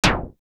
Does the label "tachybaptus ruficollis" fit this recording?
No